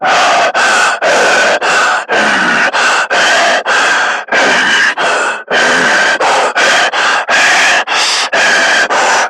Screamer Breathing Walking Loop; Warframe; Lasrian

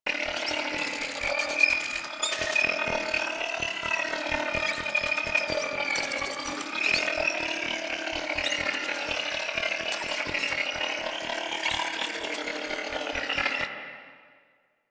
Drone Loop; Warframe; Deimos; Cephalon Drone

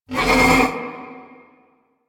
Ram Idle Grunt; Duviri; Animals

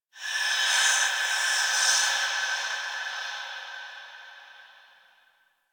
Breaths; Warframe; The New War; Zariman

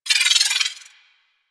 Pistol Proj Whiz, weapons; Warframe; Thanotech

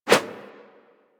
Throwing Dagger Idle Catch Whoosh; Warframe; Weapons

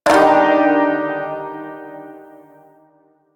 Shawzin Min Chord E, Music; Chords

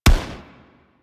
Arch Long Rifle Shot, Weapons; Warframe; Archwing

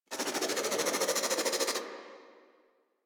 Infested Crawler Attack; Warframe

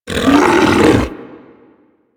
Feral Vocal Downed Break Free; Enemies; Kubrow; Tenno; Warframe

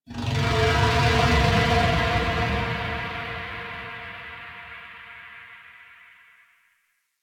Chimera Main Room Creature One Off; Ambience; Warframe; DE

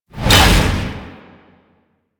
Heavy Punch Whoosh; Warframe; Duviri; Dax

